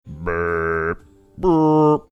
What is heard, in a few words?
making trombone bass support sounds